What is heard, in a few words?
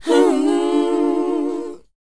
WHOA C, Vocal R&B & Dance; ILIO; Vocal Planet